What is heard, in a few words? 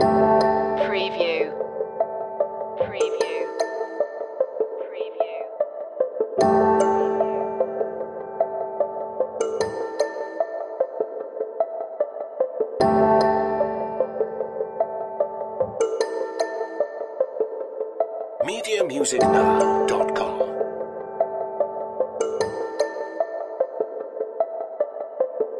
pieces falling into place loop